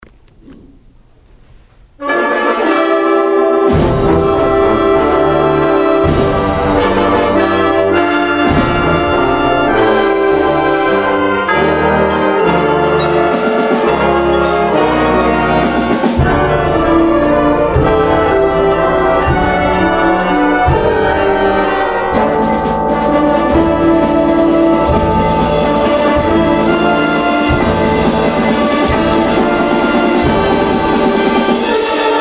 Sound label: Celebration Fanfare